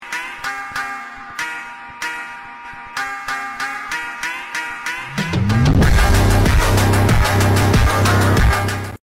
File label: car music